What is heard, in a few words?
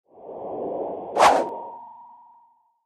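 fist attack windup; En kasket fist atk windup; Fight Knight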